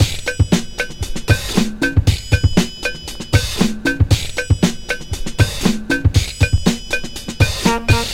117 bpm breakbeat g sharp key; drum loops

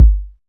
reverb kick drum single shot g key; Duration 0:01; Channels Mono; Bitdepth 24

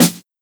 clean snare drum a sharp key

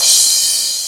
long room reverb open hat sound c key

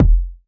phat kickdrum sound F key; drum single hits one shots; kick drum samples

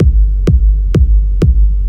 dark kick with reverb big; drum loops

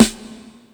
boomy steel snare drum a key; snare drum samples

